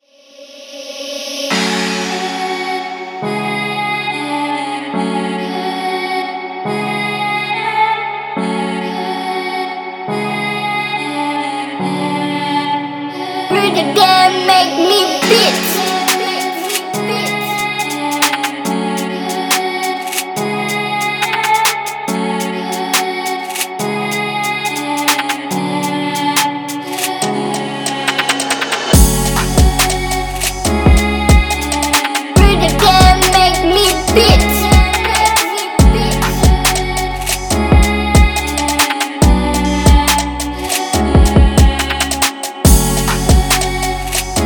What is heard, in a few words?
Drill Beat